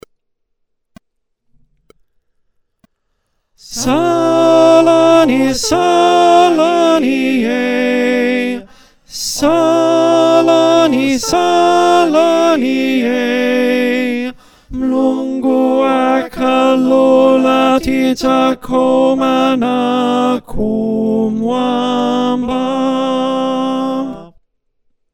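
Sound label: Alto, choir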